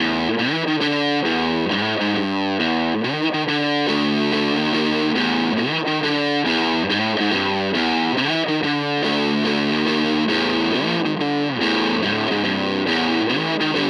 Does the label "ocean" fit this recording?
No